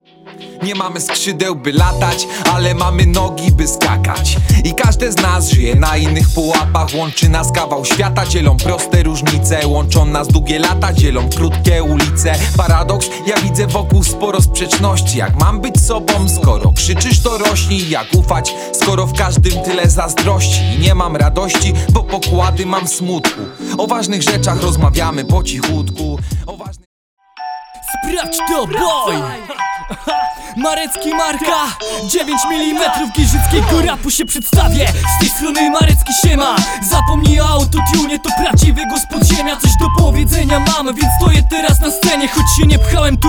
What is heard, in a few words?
hiphop